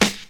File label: clean acoustic snare sound g sharp key